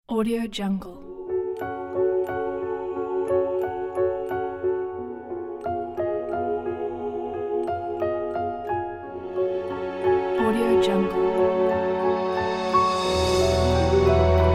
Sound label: magic intro; jingle-republic